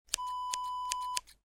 scissors snip

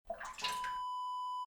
sauna water droplets

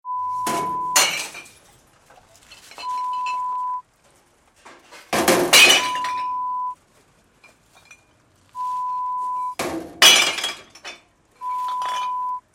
Bottle Bank sounds

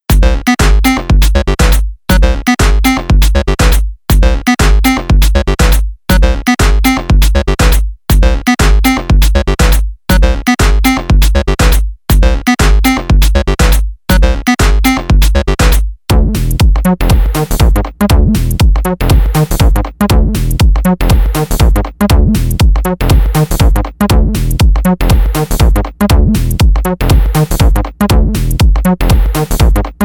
loops electro house; gigaloops

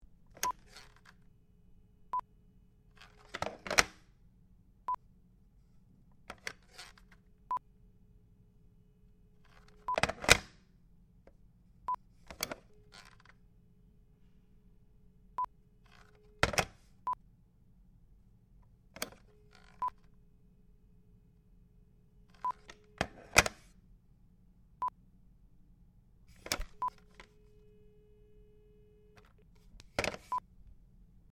Telephone Receiver

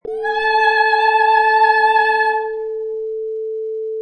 Female Operatic "La"

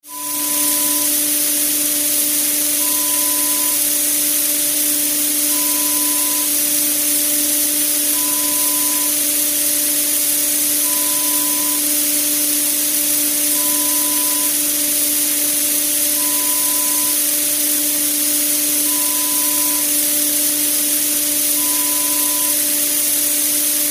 Snow Machine

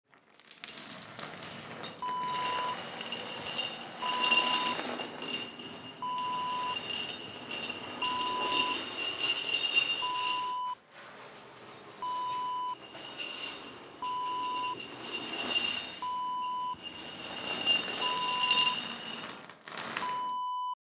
chain dragging

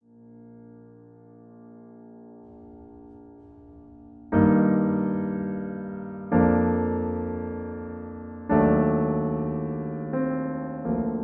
Accompaniment Bflat

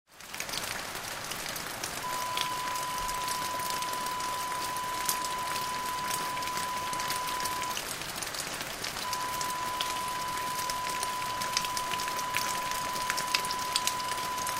rain gutter ground

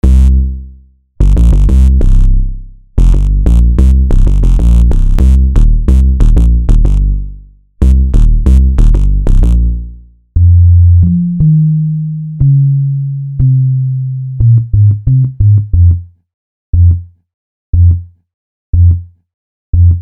hh bass loops

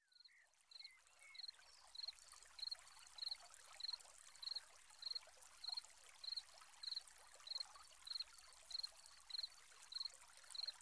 Forest Brook

